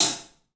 club hat; 3D Sound; 3D Drums

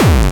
Distortion Kicks, Bassdrums

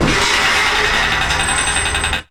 CRASH, Anime Sound FX; COMIC

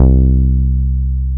BAS MKS-50; Best Service Gigapack 2

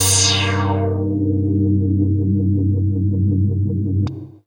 HITS, Special FX Twisted; USB Soundscan; AKAI

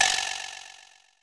perc, Roland MC-909

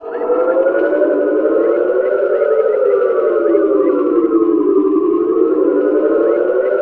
ATM SYNTH; Best Service - XXL Production Effects

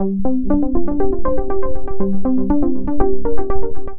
Pro Bass, Bass Foundations; Sonic Foundry (Sony Creative Software); The Electronic Music Manuscript Collection

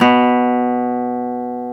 GTR Nylon, Roland; 90's Sample CDs; Club-50 - Foundations Roland; Nylon Martin; Nylon 1

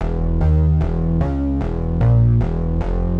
Tense Bass 75-E, horrorscape samples 75bpm

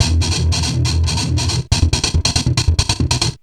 Treat Guit, Industrial Nasty

